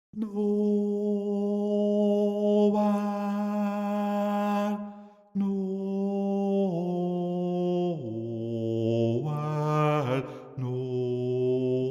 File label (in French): noel basse